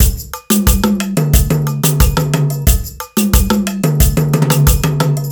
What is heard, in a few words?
Percussionist; перкуссия